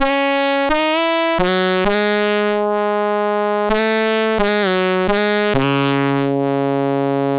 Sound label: Horn; fabrik core